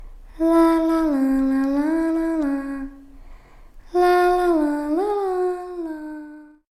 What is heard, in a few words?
creepy little girl singing lalala